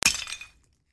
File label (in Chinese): 砸金蛋音效; goldegg